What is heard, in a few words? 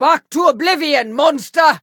poleaxe bearer attack; blades